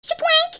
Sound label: CARTOON